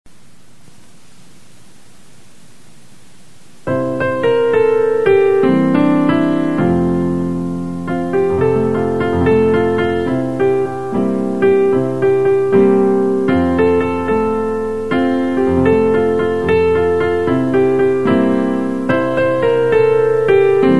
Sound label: e music